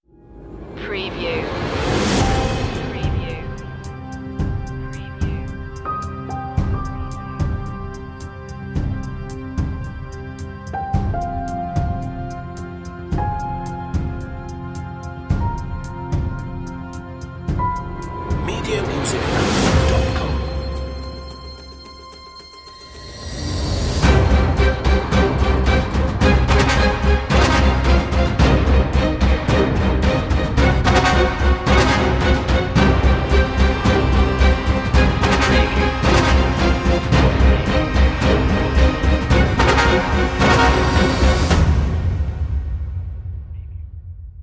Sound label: super trailer